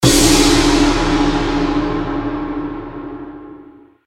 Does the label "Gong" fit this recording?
Yes